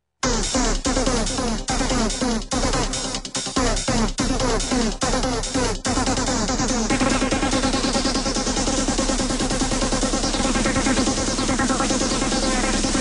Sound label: Ending; Cyborg 009